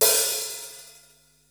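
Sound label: hi-hat open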